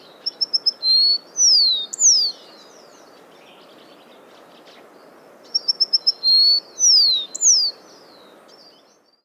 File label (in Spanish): Chingolo